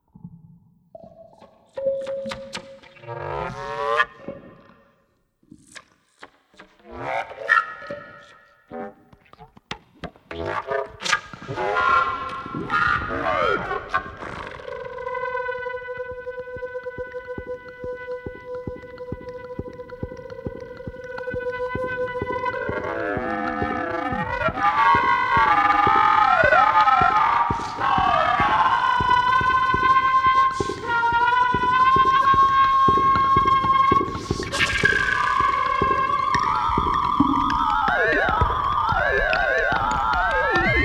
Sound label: Guitar Flute